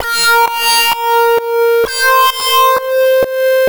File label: String, 130bpm, Nu Dance Electro; Zero-G; Soundclash; Combi Loops; The Teuton